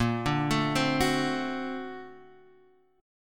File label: A sharp Augmented Major 9th A sharp, Guitar DADGAD